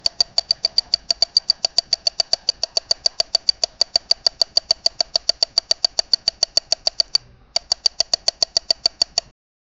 cricket bat hitting crick

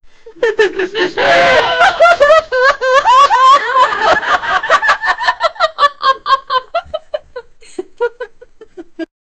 late night show audience, audience reaction

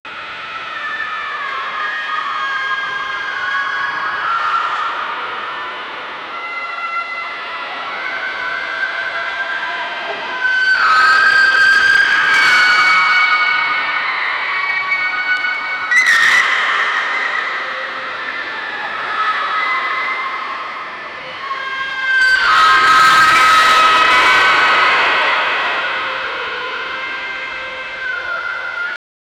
horror ambient sound duri, asylum

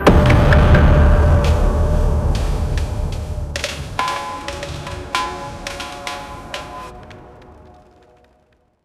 epic transformation sfx

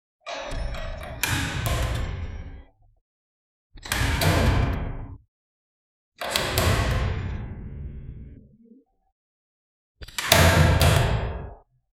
sound of several images p, clack

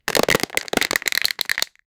fast repeated squeezing, plastic

